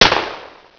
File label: shot; RedCat en de Spannende Stedentocht